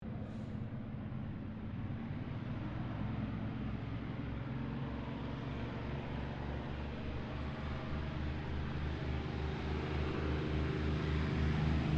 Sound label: AIRCRAFT P-40F KITTYHAWK TAKEOFF; P-40F Kittyhawk / Warhawk/Tomahawk; 1942; Airplane Sound Effects; Airplanes (Sound Effects:Vehicles)